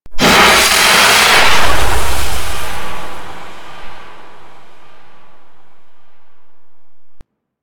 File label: Golem Death, Enemies, Vocals; Warframe